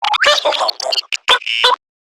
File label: Garden Drone Vocal; Warframe; Zariman; Zariman Gardener Drone